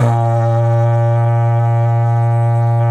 BF1 HORN R, Didgeridoo and Other Primitive Instruments; Big Fish Audio; DIDGEHORN1